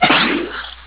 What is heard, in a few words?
whisk